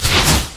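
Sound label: rolling thunder attack; LoL; volibear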